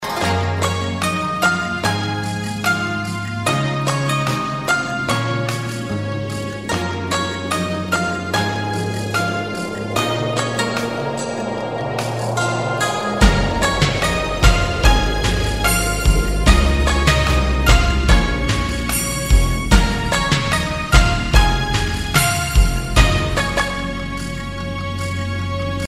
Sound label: Nights Dream Melody